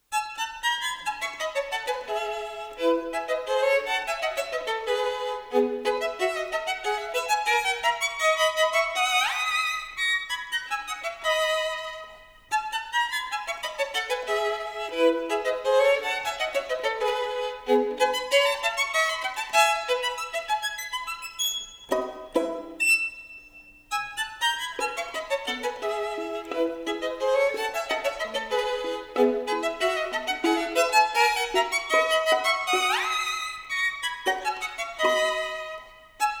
Etude-Caprice in E major Op.18 No.5; HENRYK WIENIAWSKI

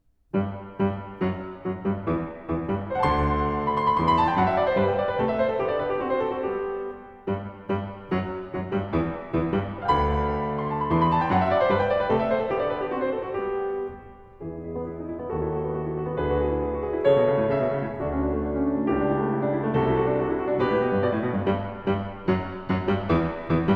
Ten Variations in G major K 455 - Variation IV; Mozart in Vienna; Linn

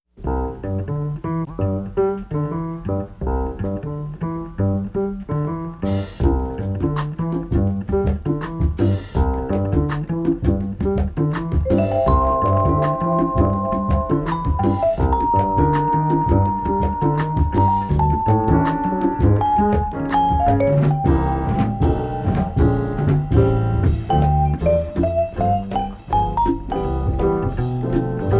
Big Band & Bebop; 째즈

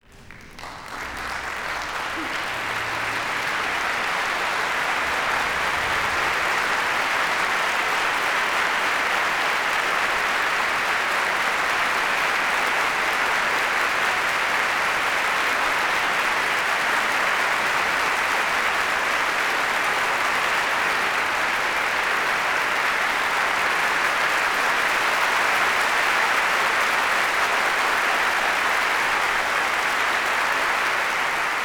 Applause; Celibidache; Bruckner-Symphony No.8; Altus

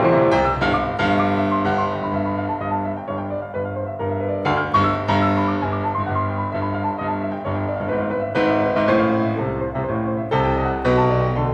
Allegro, C major, piano; Beethoven; Diabelli Variations in C major, op.120; Brilliant Complete Beethoven Edition

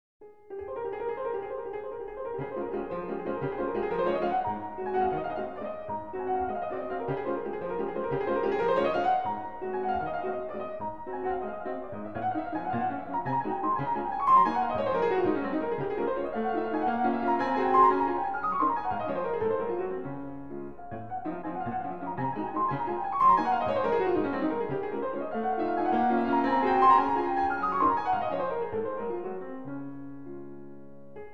Waltz No. 6 in D flat major; Chopin; Op. 64-1, ''Minute''

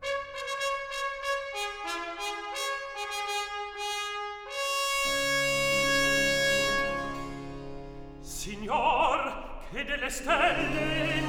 Recitativo; Signor, che delle stelle; Handel; Rinaldo; Act 1

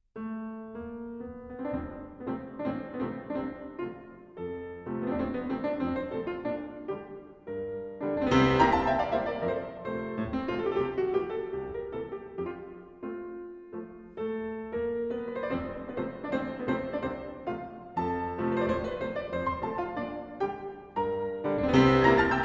Hungarian Dance for piano, No. 7 in F; Evgeny Kissin Plays Brahms

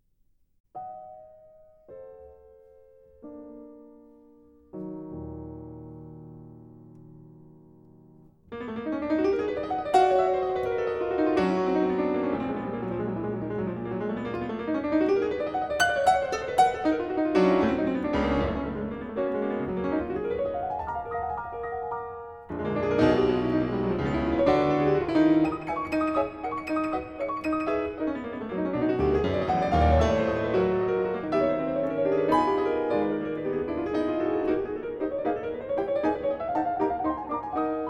Etude-Tableaux Op 33 No.6 in E-Flat Minor; Rachmaninoff

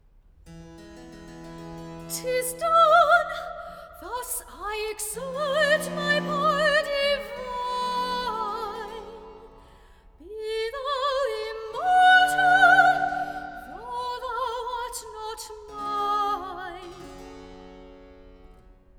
Recitative; Tis done Thus I exert my pow r divine; Handel; Acis & galatea

